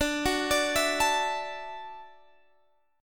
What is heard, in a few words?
D-Major Add 9th D down Guitar Standard; arpeggiated; JGuitar